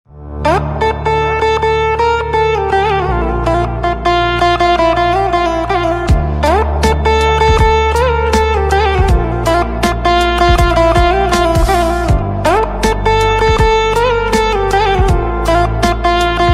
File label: Veena, instrumental ringtones